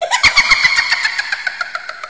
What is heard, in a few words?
ghost laugh; cstrike; sank sounds